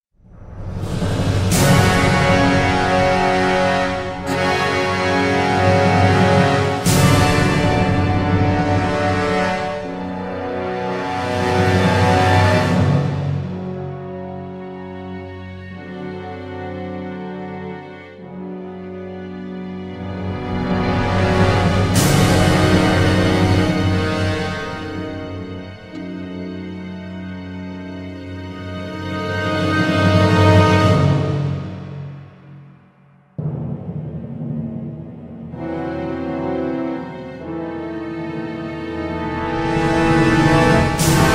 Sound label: Defeat; bullet witch